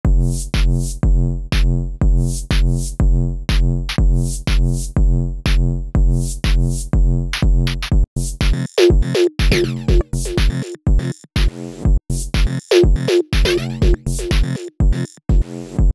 House Electro; Multitude

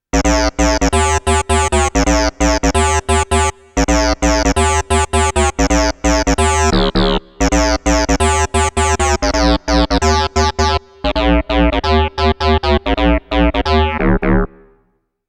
Cyber Bass; KORG Turbo

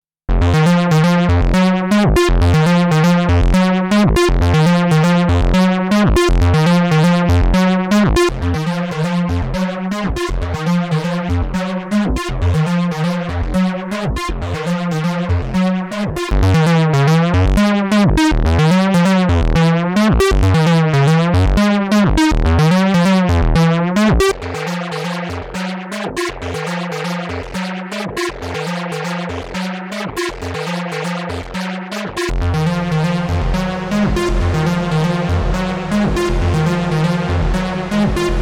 Analog Bassline; Blend

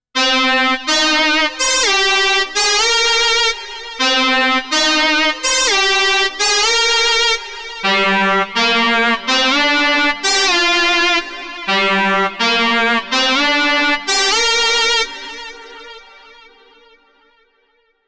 Hard Bow, KORG Node

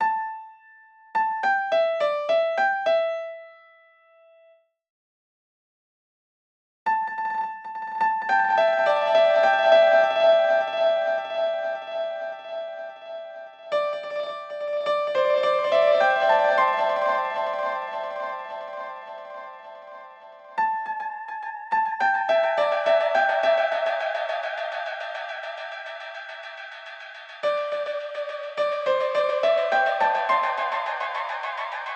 Dispersion Acoustic Piano

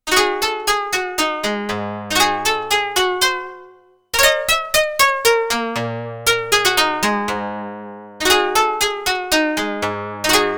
Hammer Koto; KORG Tube

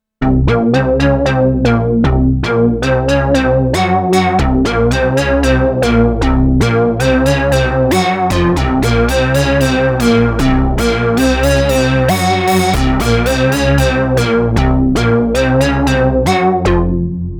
Multi Wave; dense

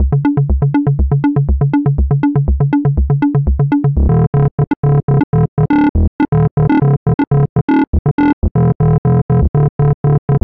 Synth Arpeggio; Robotizer

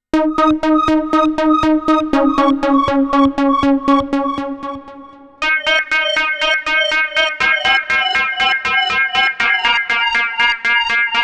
Korg Layered Motion; Korg Ring

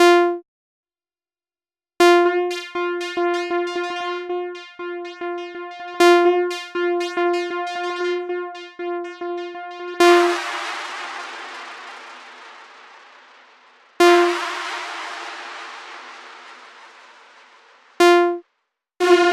Single Note

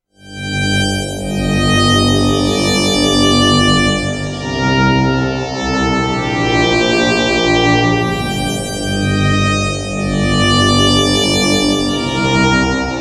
Space Violin; KORG Turbo

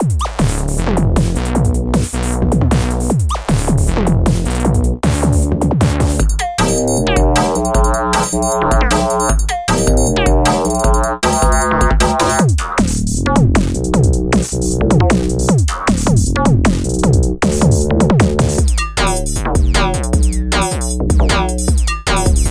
One Pattern - Four Kits, prologue; KORG Groove

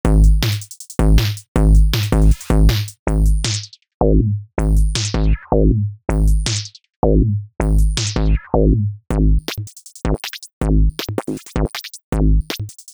Electronic Drums; Cluster

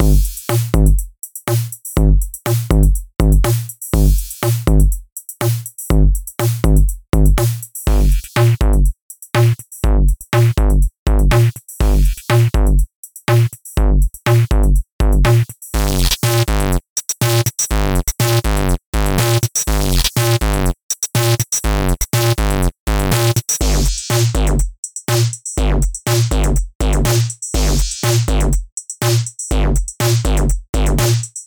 Electro Break; Corrosion

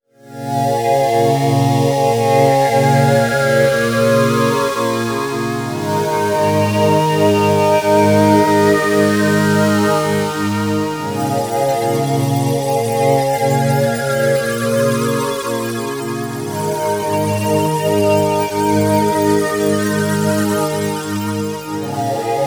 Synth Pad; Vague

